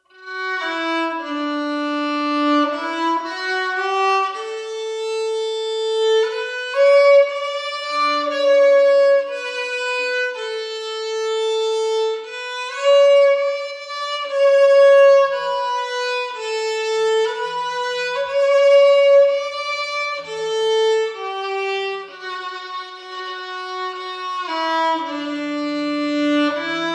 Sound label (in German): The first Noel, Violine